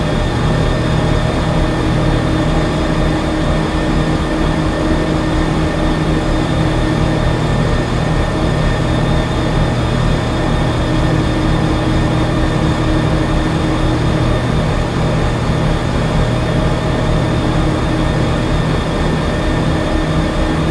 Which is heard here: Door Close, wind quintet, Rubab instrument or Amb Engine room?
Amb Engine room